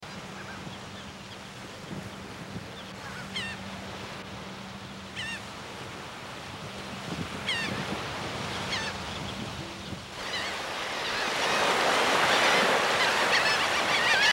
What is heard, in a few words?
cape may shorebirds